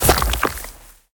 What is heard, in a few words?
sand hit; smite; Anhur